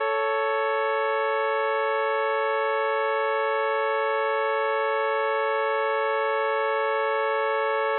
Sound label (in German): terz 440 20 cent abweichung